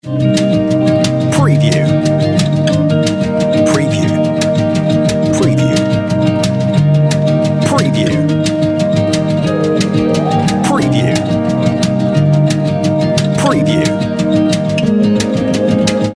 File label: On Hold Music